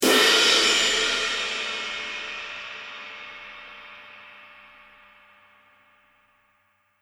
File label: cymbal